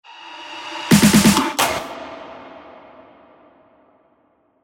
Drum Fills (135 BPM); techno fills; revealed techno fills vol 1

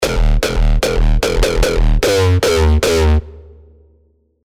Bassdrum; revealed serum hardstyle vol 2

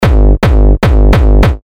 Kick G#; Jason; Revealed Terra Kicks

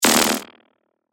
FX Hat, cymbals; revealed cymbals vol 1

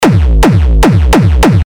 Kick G Rupture, terra kicks; Revealed Terra Kicks

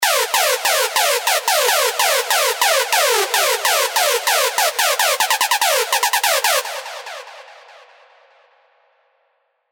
Screech, Sylenth1 hard techno; Lobotomy; revealed sylenth1 hard techno vol 1

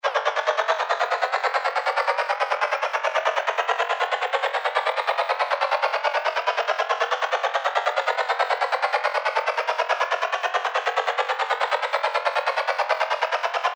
Noise FX Loop 140 BPM Climb; revealed fx loops vol 2

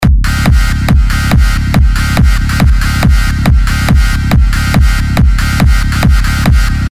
big room techno drops; Clanker Order 66; Revealed Serum 2 Big Room Techno Drops Vol. 1

